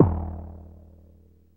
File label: BELL; DRUMS & PERC